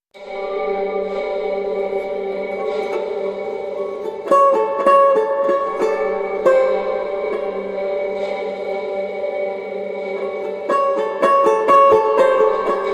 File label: Suspense